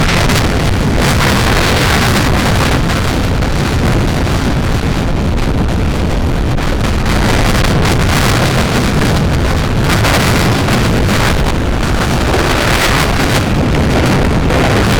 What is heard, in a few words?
world war 2 battlefield, artillery